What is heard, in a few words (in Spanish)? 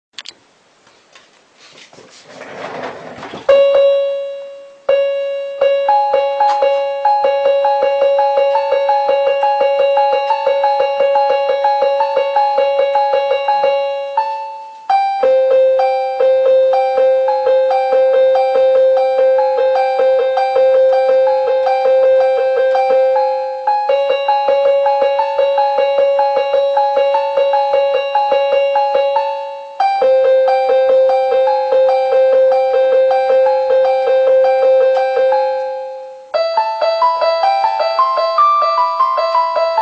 musica de miedo piano